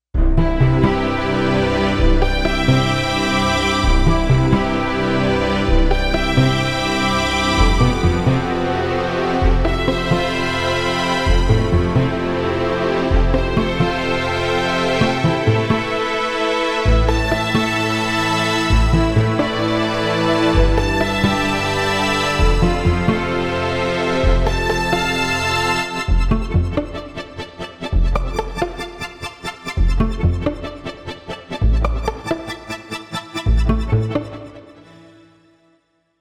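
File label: Strings ORCH ARPEG, synth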